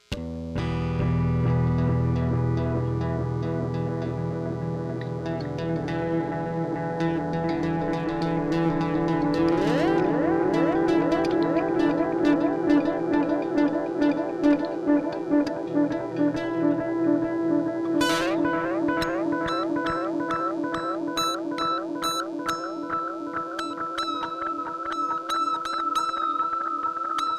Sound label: electric space jam